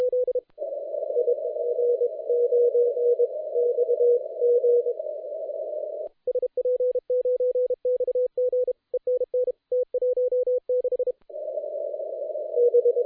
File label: CW 7MHz; contest; SP9XG de SN7O; TTC-SPCWC